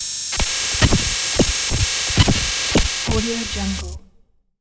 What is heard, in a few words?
textures cyborg malfunction; sinematic 2; soundmorph